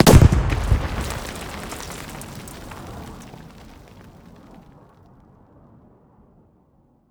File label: close, explosions; gmod; lvs framework